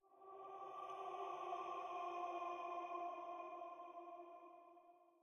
灵魂沙峡谷幽魂声; ambient

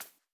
兔子跳跃声

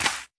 甜浆果丛放置声

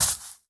下界苗踩踏声

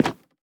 樱花木 活板门 开关声

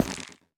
下界木悬挂告示牌踩踏声

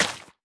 湿草 挖掘声

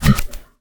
骆驼冲刺就绪声

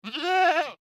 山羊待机声

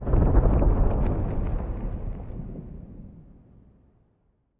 下界荒地氛围声; ambient

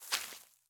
大型垂滴叶向上倾斜声

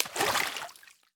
炼药锅染色声

